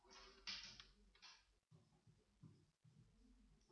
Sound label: pipe